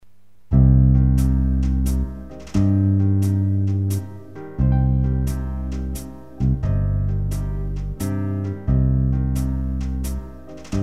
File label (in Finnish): Hidas valssi